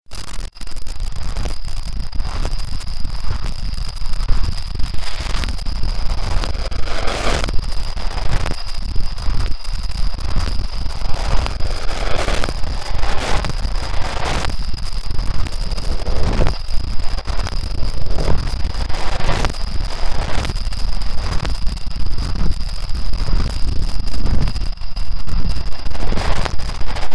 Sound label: noise outro